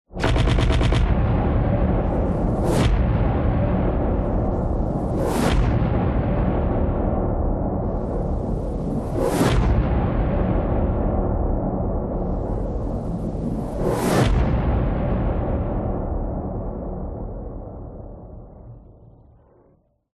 science fiction battle cannon shots incoming missile explosions; zapsplat